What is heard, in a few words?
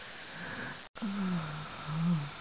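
heavy breathing; hvybrthg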